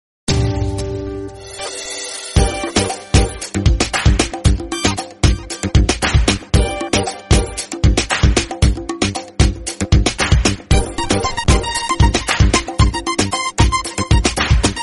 hip hop piano contact tone; samsung ringtones

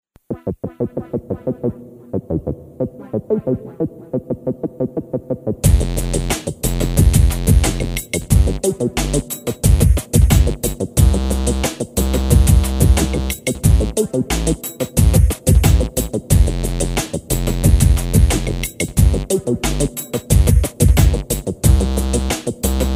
curious electronica famous musical tone ringtone

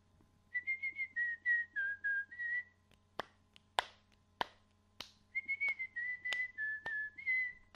whistleee

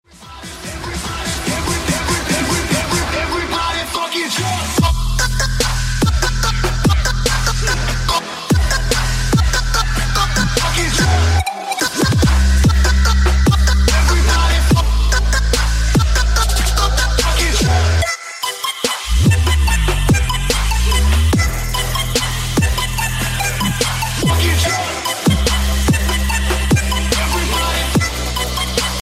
trap mix ringtone

